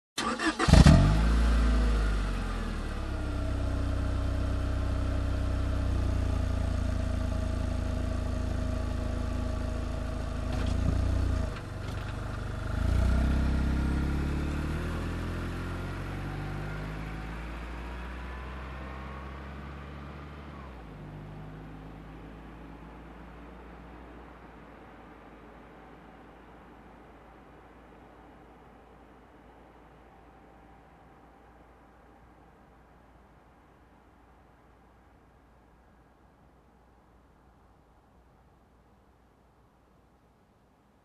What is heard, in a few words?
Car engine ignition and drive away